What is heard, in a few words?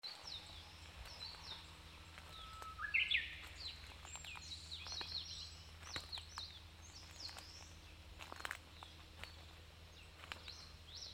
bird humming